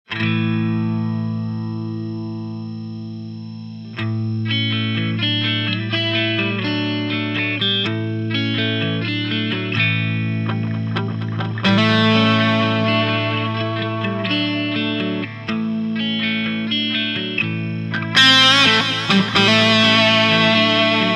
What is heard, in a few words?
clean blues 125